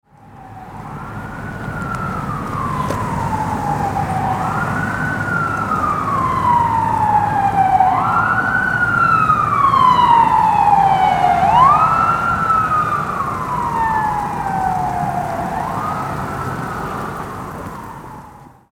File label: Ambulance siren sound